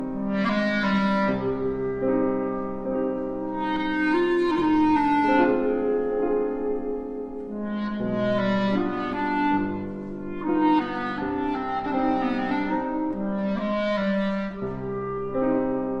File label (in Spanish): Clarinete Csárdás